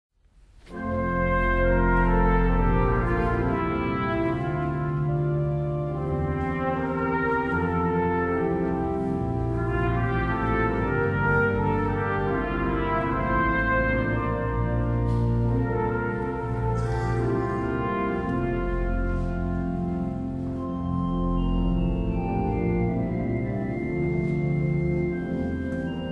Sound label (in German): Suite F-Dur Largo Trp. Org; Telemann